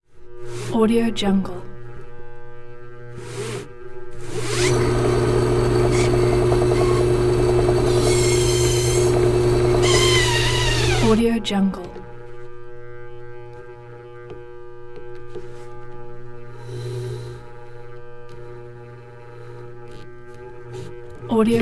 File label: drill press